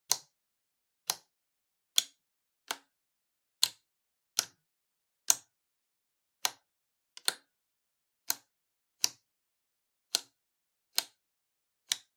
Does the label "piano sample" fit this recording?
No